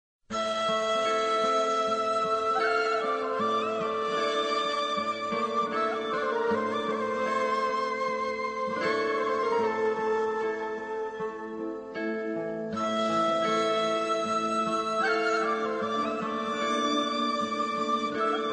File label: turkish flute, ringtone